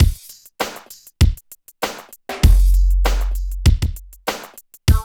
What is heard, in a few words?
DRUM LP; East West; Phat & Phunky